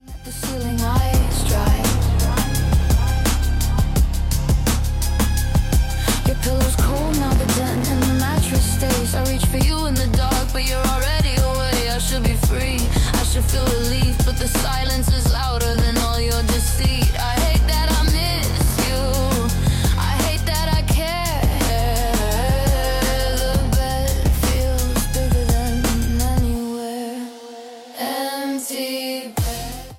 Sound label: empty bed